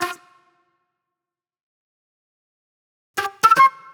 Jammy Flute

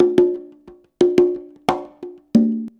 CONGA, Modern Percussion Loops

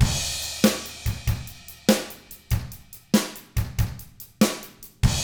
ROCK 6/8, Drums; ILIO; Double Platinum Drums 1

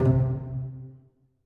bass piz C3, Strings; BBC

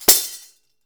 DAF HIT; WorldSounds

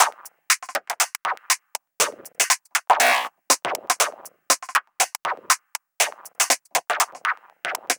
Hi-Hat Esoterica, Beats

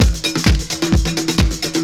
drum 130 tribal, drumloops; Techno Trance Essentials

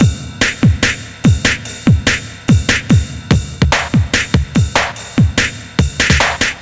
drums, Hardcore Breakbeat, 145, Ebmin; Big Fish Audio; Hardcore Breakbeat Action; Riddim

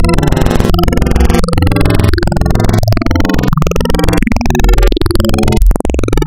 Spectral, wavetables; Architecture 32

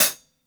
HH MEDIUM, HI HATS; Basement drums vol 2

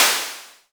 Hats, Elektron MachineDrum; SPS1 MKII

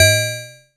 BRIGHT, PERC HITS; Zero-G Ambient 1

